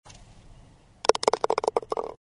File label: golf putt